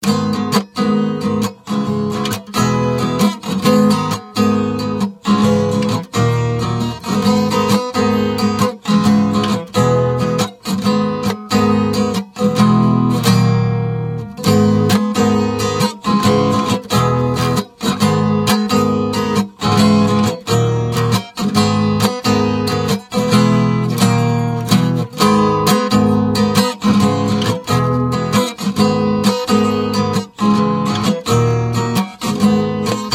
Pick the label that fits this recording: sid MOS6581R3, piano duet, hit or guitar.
guitar